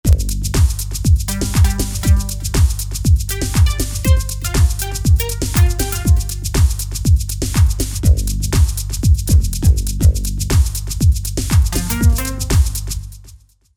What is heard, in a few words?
House Rules